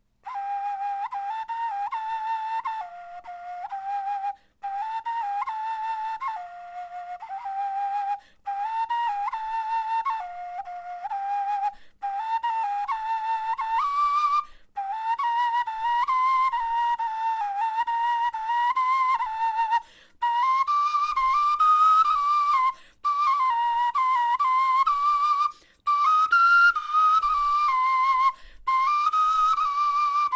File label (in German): melodie